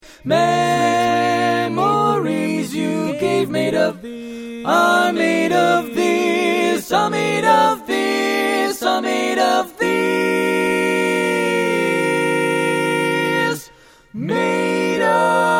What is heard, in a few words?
Tenor, barbershop tags